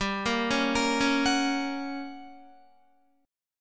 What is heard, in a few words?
G Minor Major 7th Flat 5th G, Guitar, 4F, 4A, 5C, 5G, 5C, 6E; GmM7b5; arpeggiated; 14,13,13,15,13,14